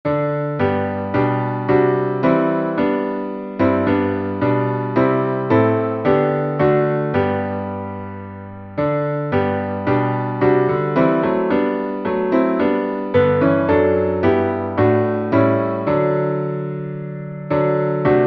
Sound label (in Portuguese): salmo instrumental; Salmo 105B